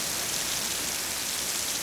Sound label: concrete roll wet